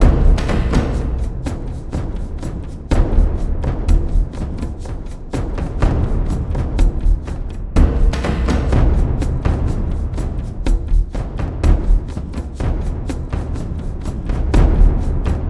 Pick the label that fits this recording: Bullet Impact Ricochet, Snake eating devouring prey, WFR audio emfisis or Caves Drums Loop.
Caves Drums Loop